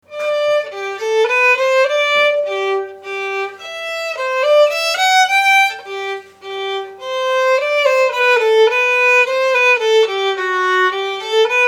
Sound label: Minuet G; Bach